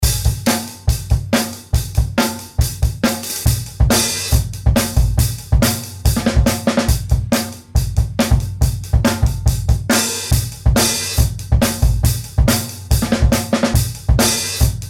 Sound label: drums; orange drums xl demo